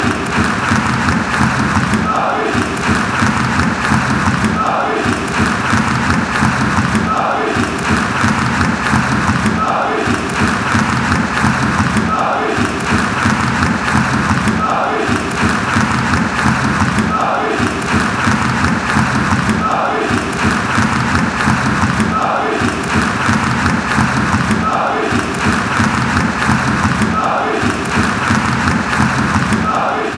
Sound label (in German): rapid klatschen